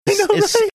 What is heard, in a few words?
laughing funny